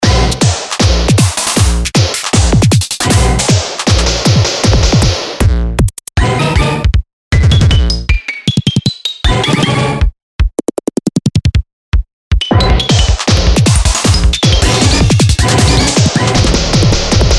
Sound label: step sequencer; transformation scene; weeklybeats 2014